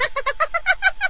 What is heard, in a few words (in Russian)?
hihikane